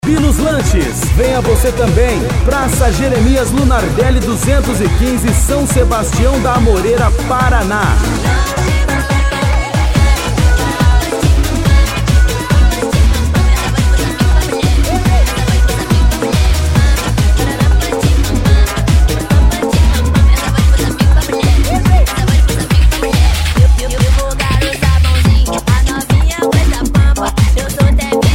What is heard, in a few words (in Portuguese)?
electro funk e electro dance remix